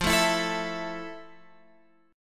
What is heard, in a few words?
F Major 7th F, Guitar, Open F; strummed